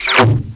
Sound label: Cartoon; 特酷声音素材库